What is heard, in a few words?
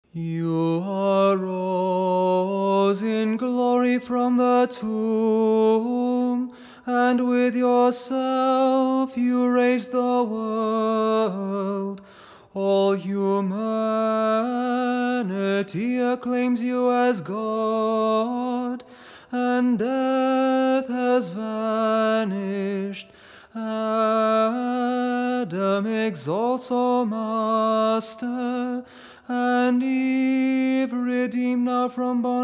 Tone 6 kontakion